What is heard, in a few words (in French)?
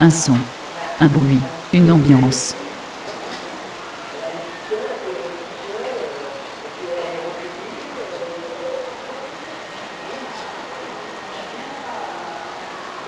Un son un bruit une ambiance